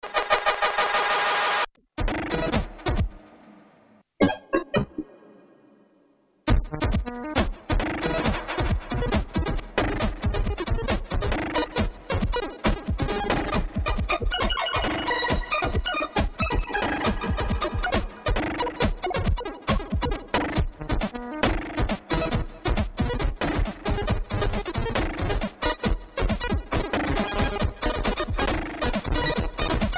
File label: Dub